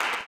CLAP, blues & soul; ILIO; Vocal Planet

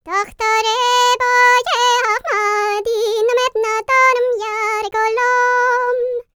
PERSIA, World Voices, Vocal; ILIO; Vocal Planet VOL-5

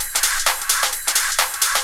drum 130, techno trance drumloops

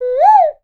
bird calls, percussion

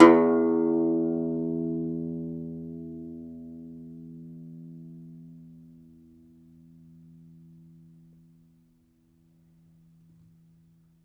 DOMRA, Plucked Strings

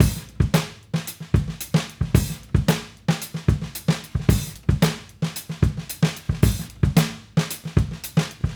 Raw Funk Drums, 70s Analog Funk

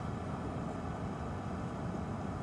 Ambience Loop, outdoor

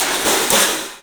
CYFX, EFFECTCYMB; 3D Sound; 3D Drums

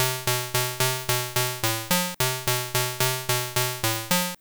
70s Random 109 B, 70s Sequencer Synth Lines; Zero-G Kocktail Kollection

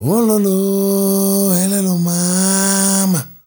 MALE SOLO, Heart of Africa; Spectrasonics; Heart of Africa 2; S.MALEDRY

